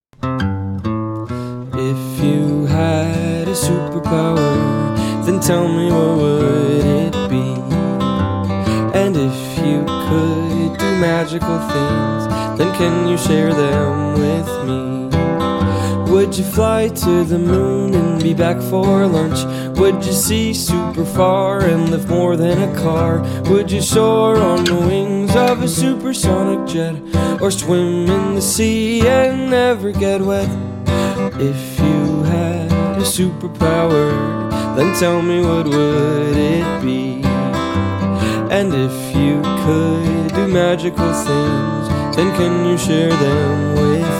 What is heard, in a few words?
with vocals; Drama Notebook; Guide Vocals; elementary music curriculum; songs for kids